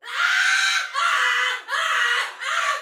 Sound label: Death Fire Soldier Female, Battle; Dialog; Sinecure